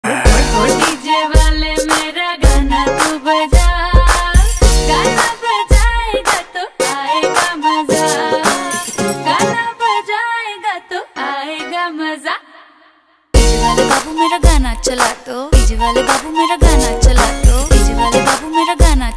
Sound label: rap ringtone